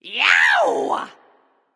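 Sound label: yay, female; smite; voicepack; hera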